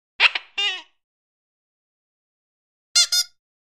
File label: Horn 2 Toy Horns Honks